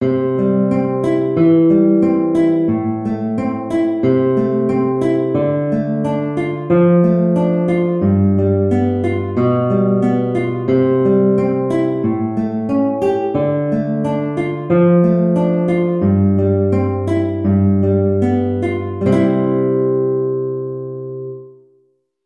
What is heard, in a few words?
Prelude In C, guitar